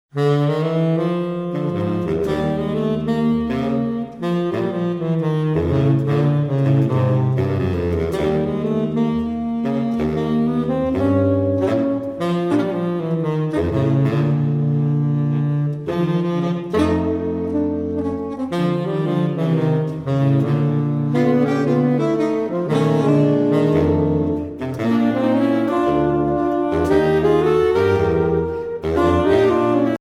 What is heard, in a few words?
For Saxophone Quartet